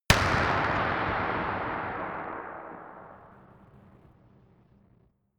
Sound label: gun dark pop gun